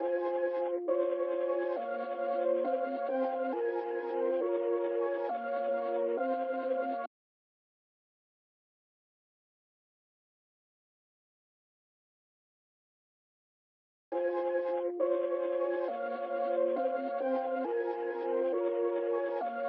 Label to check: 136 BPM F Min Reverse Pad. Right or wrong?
Right